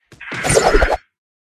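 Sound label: Pet Monkey buff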